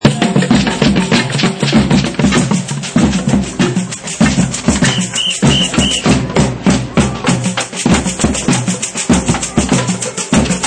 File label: Rehersal Jam